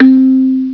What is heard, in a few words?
Kalimba; Fractals; MandelMusic